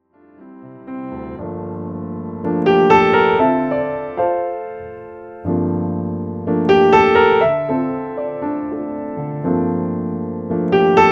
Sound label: Solo